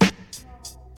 old school bassy hip hop steel snare drum sample g key